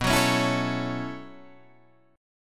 B 7th Suspended 2nd Suspended 4th down Guitar Drop D, chord